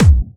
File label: BD Punchy; Vengeance Samples; Bassdrums